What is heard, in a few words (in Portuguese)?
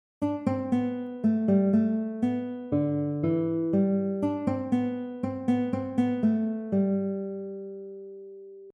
melodia escala maior; guitarra; melodias na guitarr com escala maior